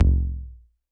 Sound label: ANALOGUE TOM LOW plus; drum machines